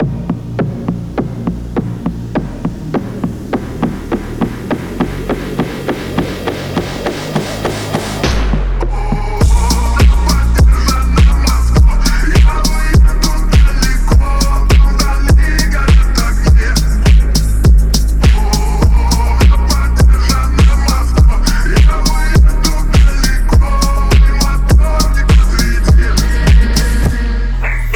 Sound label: phonk